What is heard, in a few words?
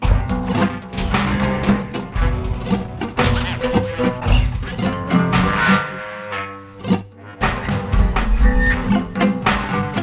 Strange reggae